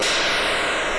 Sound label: laser